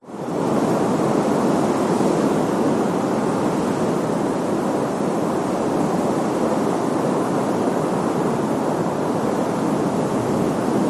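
nature ocean waves rough distant wash against rocks top of headland cliff insects; zapsplat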